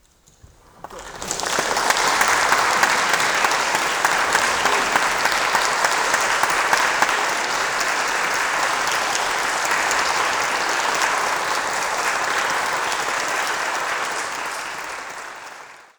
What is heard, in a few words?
applause